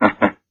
Vocal hehe

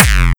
Kick Dist; The Jocker; Electroshknetw

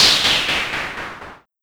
Noise Random SFXR

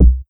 Kick House; Ghost Fader; pitchglitch samples

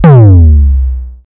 Kick Long

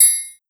Perc Triangle

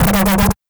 Sound FX Lazer Granular

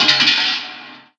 Perc Metallic Quatruple

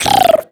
Beat Box FX

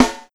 Snare

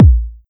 Kick

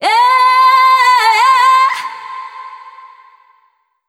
Vocal Uhhha D

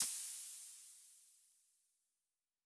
crash; foxdot default

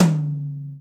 tom; foxdot default

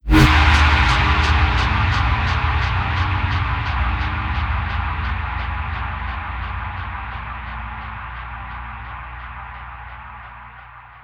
Riser Rev Faling

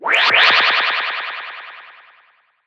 Old Dub

